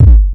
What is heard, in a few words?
BBASE, DRUMS PERC; Q Up Arts; Dream Experience